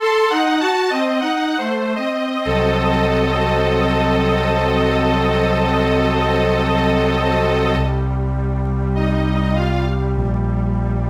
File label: Lose Loop; ds; gamerip; Heros Saga Laevatein Tactics